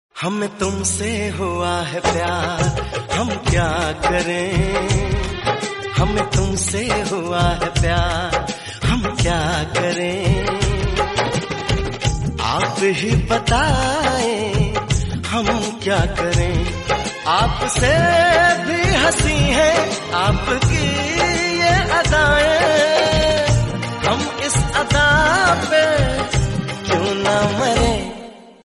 hindi sad

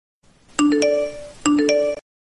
iphone message

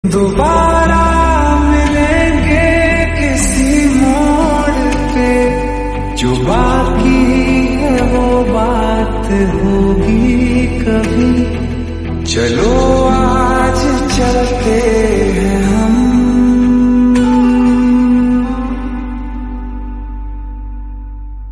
slowed reverb

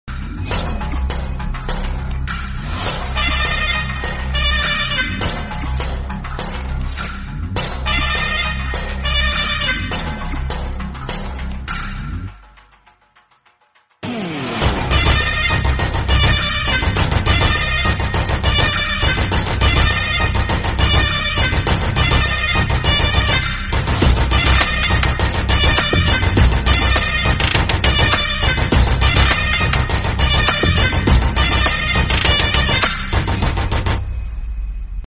entry bgm, tamil; vikram; surya; kamal haasan

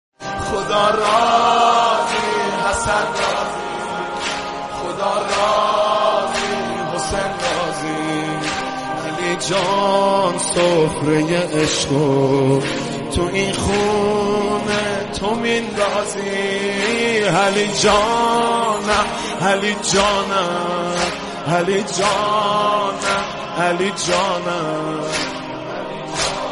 islamic song

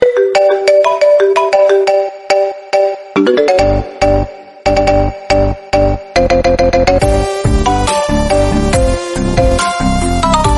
bgm; pokemon

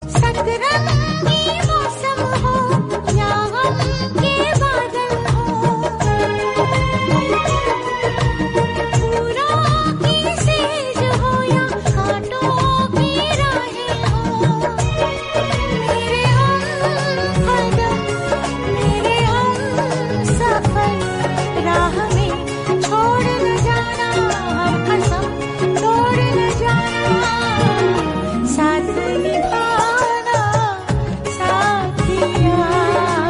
song, Hindi; Saath Nibhaana Saathiya

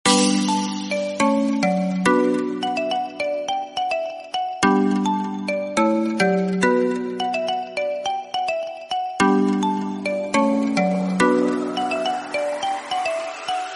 summer energy background music

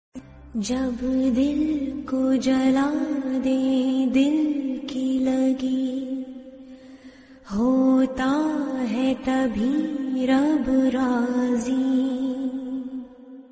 romantic hindi

